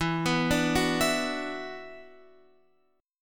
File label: E 7th Suspended 2nd E down Guitar Open F; arpeggiated; chord; JGuitar